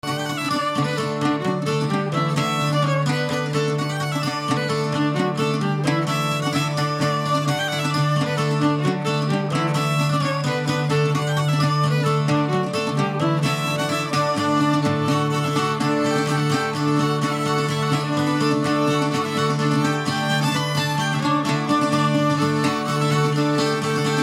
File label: Polka, folk